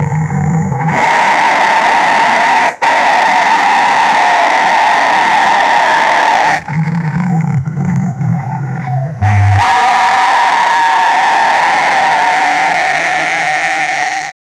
roar of a rock amphibian; amphibians

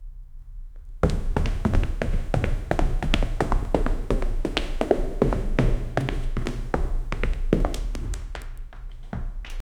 fast hurried footsteps

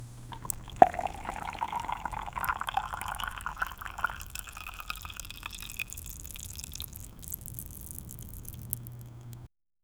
the soft sound of liquid

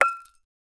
equip metal helmet on; equipment

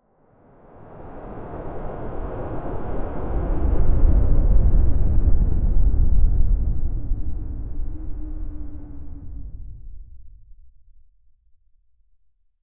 a very subtle and atmosph, air

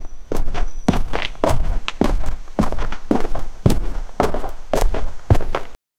warehouse

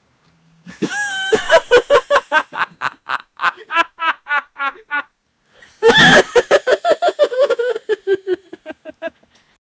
people laughing at someon

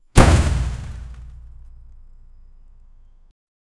combat

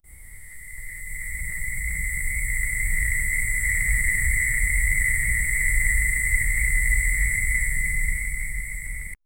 a snake slithering on the, reptiles

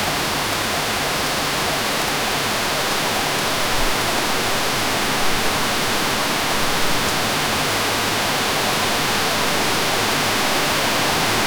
heavy highland, scottish

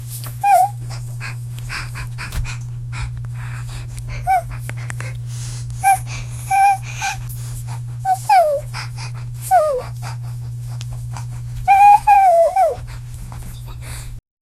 puppy whimpering

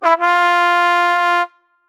triumph trumpet fast soun

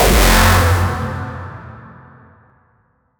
loud sharp discordant jumpscare

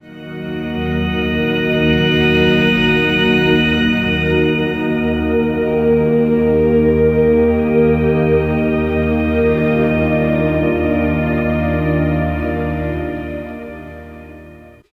dark ambient intro with d

